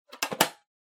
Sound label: Pencil Case Close